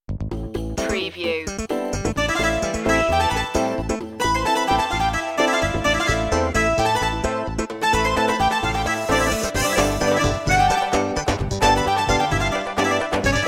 egyptian dance no synth lead

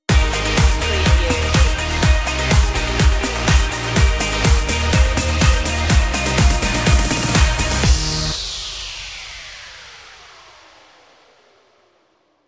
beach dance stinger

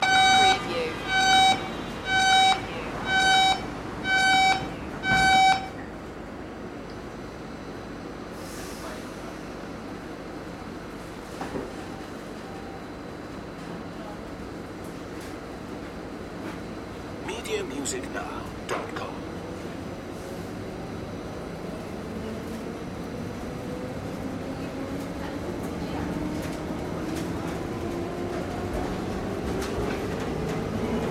monorail kuala lumpur loop

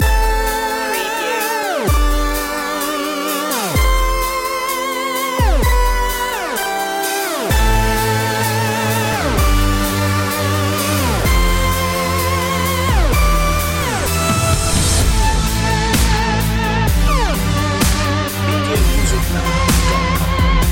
destroy dubstep

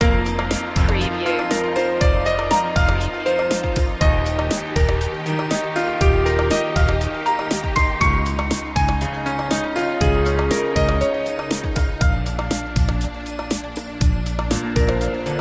string beats

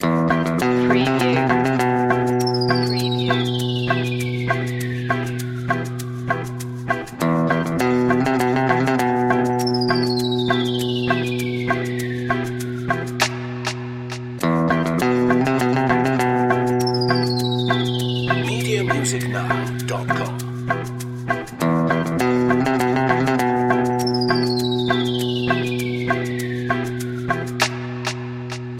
latin loop

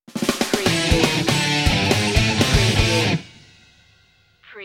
rock sting

